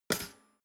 toaster pull up hard

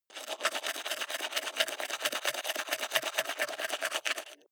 teeth brush wet open inward

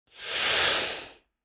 inhale male

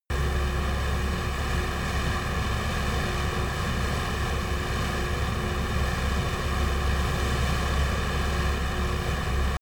military jet a-10 thunderbolt turbine idle close

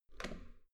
metal door heavy handle up soft

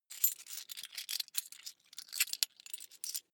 coins big search long

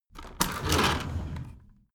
window roller shutter open soft single pull

